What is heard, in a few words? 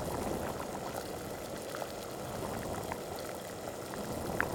make soup